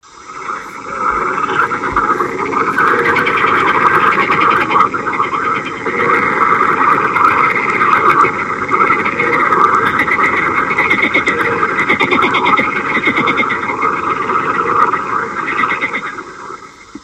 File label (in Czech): Skokan zelený; zabi; skokana; zabi stezky